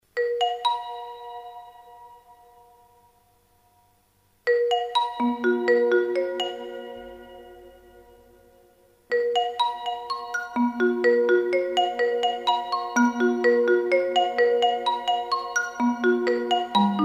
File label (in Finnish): ksylofoni